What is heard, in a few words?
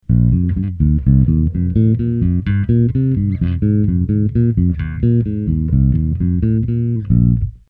fat tube fingered, SansAmp Bass Driver D.I.; Fat Tube - Fingers